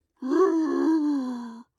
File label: Zombie Cry